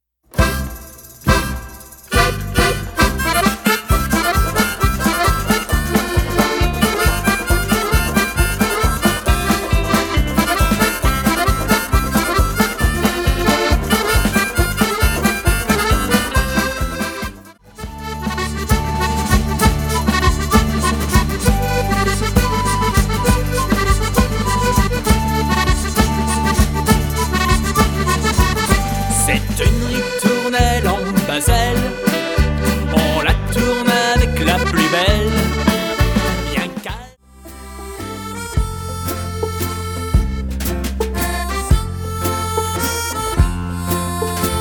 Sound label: L'Accordéon Dansant